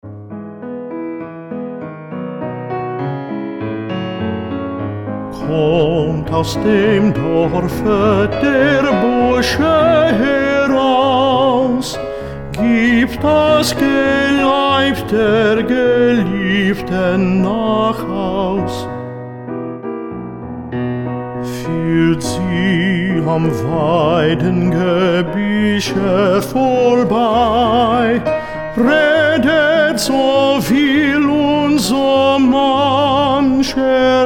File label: tenor